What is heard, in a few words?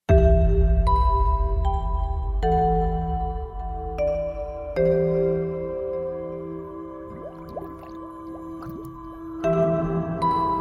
Underwater; maplestory